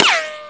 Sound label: ricco, machinegun; Messiah; WEAPON